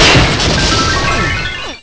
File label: tree; Carma